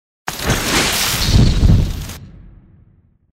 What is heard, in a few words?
Thunder element